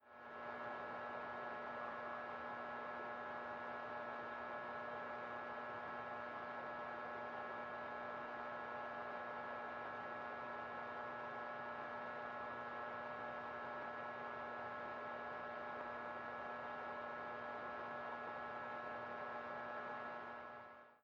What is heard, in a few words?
Hum